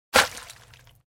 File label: Juicy Impact; Fights Bodyfalls